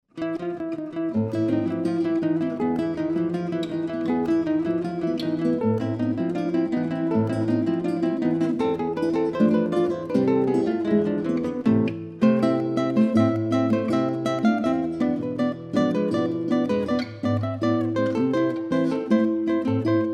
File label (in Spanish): milonga, tres guitarras